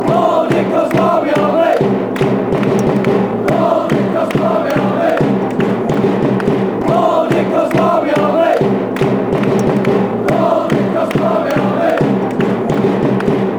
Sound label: barmy army, fan chants; paul divkovs